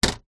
electronic typewriter plastic paper feed flap movement; office; brother ax 450